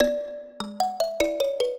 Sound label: minuet, mbira; Mozart